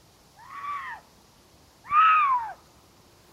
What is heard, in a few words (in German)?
Ruf eines Luchs